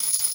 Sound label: Animation Short; Adobe; Multimedia Internet CD-Rom Flash